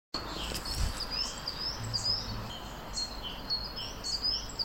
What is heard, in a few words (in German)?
tannenmeise